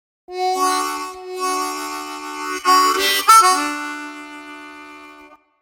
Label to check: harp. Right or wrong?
Right